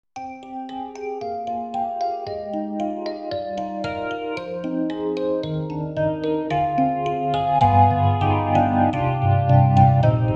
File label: EDIROL SD-90, Enhanced, Stage 73, Phase Dyno